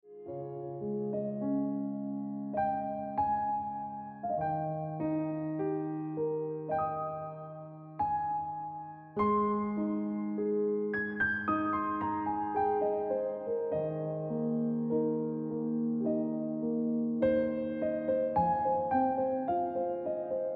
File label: Ringtone Piano Music